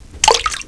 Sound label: water DRIP